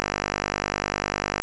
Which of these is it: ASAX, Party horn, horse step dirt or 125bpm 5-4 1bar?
125bpm 5-4 1bar